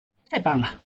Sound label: correct